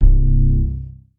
Big Bass C2

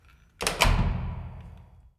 Metal Door Closing

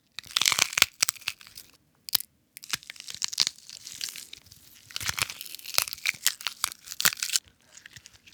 Breaking Bones

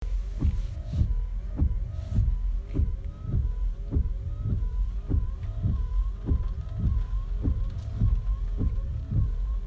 Raining And Wipers

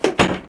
Door Close

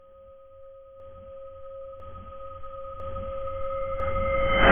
Rising Chime

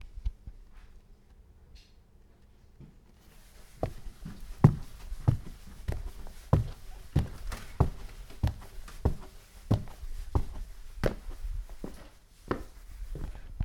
Walk On Carpeted Steps

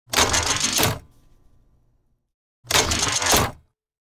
Weapon Transformation Retracting; Custom Transformers Prime

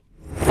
Riser

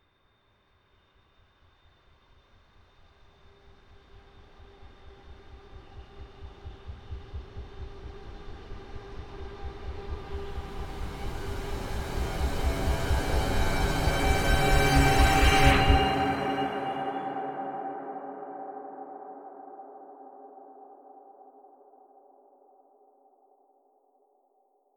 Horror Build Up; What Twisted Mind

